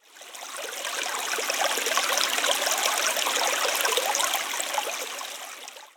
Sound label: water; minetest game; env sounds